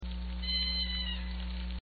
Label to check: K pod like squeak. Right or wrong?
Right